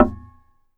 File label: BODY, guitars; Spectrasonics; Hans Zimmer Guitars VOL-2; FNGSTYLE PFM